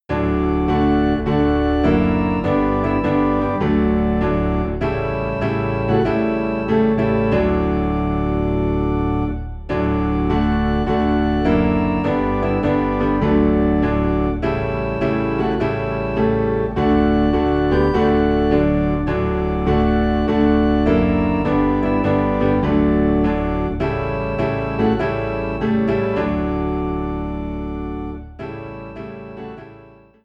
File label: piano and organ low in D; Tell It to Jesus